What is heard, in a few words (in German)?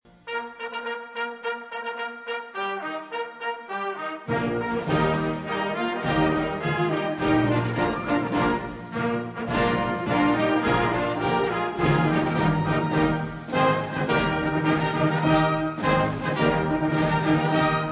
Coburger March